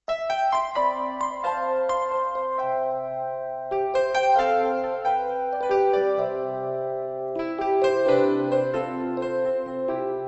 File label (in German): kleines Floetenstueck